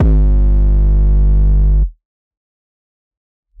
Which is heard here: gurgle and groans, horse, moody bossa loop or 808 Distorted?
808 Distorted